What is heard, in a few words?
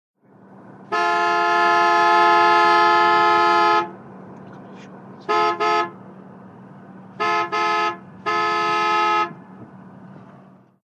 1970 Int Buick Horn; 1970's int.